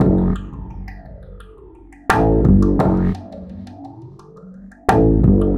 Remixed Acoustic Bass

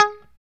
Pop G#4, Strat Pop

